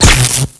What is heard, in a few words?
enemy hit wind